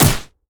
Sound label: basicattack; mafia miss fortune